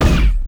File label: mini laser; weapon